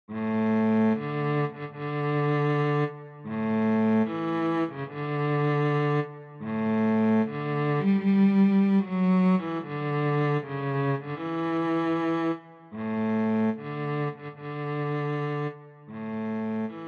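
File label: solo cello; Hochzeitsmarsch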